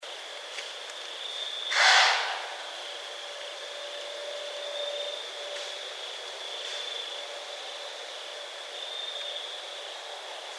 White-tailed Deer

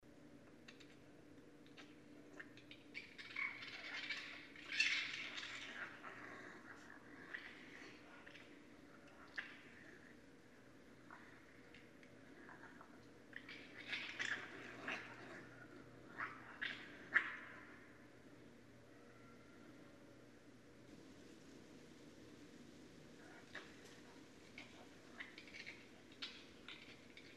Raccoon; Northern Raccoon (Procyon lotor); North America

